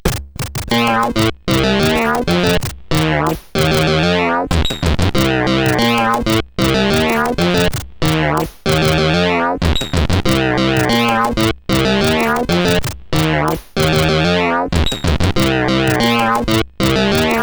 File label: Birthday Sound, CSG8580R5